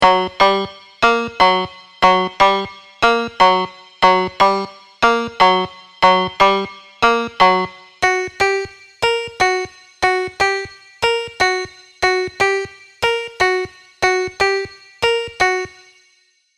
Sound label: vital presets; chiqui audio; vital plucks presets